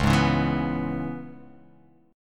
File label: C sharp Minor 7th C sharp, chord